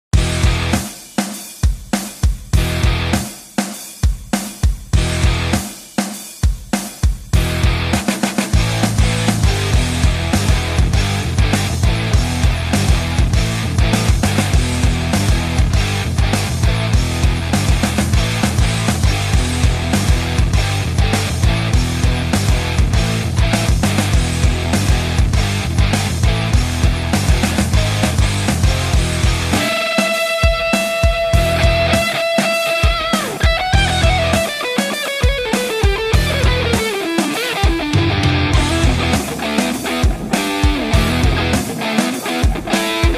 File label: joyo r 25 hot witch fuzz; pedal